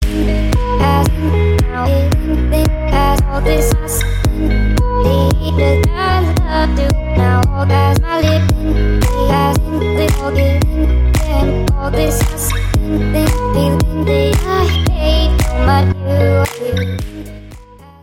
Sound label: Mobile Ringtone